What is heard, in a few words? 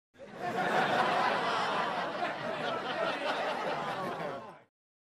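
Crowd 100 Medium Burst Interior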